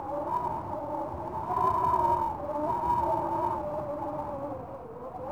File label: wind; portland